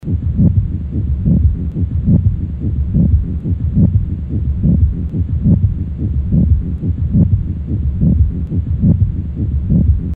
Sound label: rub